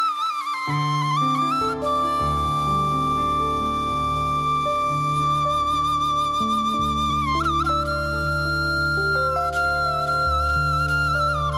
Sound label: amazing fluit music